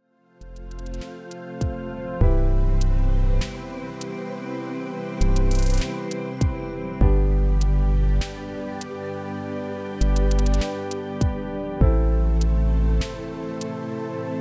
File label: ambient